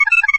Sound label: warning; Doom3